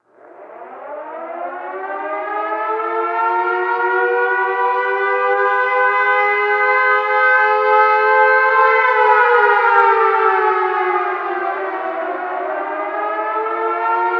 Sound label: collapse ending